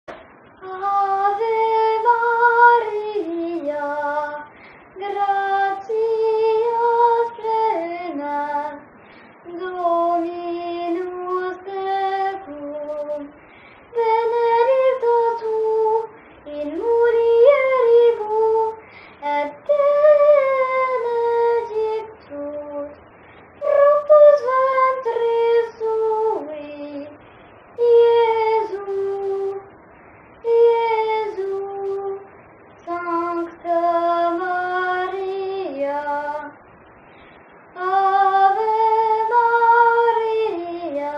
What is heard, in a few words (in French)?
Chant